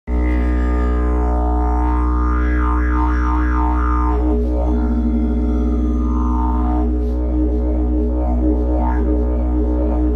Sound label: vibraphone; jilm